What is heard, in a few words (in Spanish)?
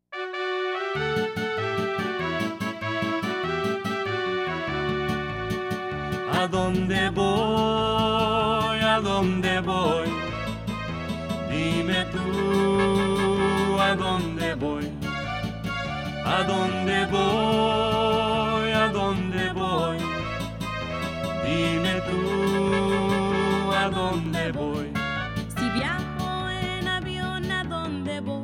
(Vocals)